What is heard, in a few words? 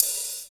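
open HAT; East West - Phat & Phunky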